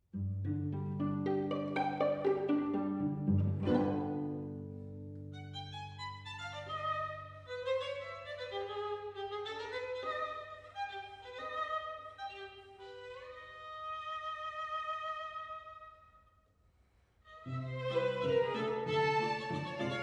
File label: polka; kopylov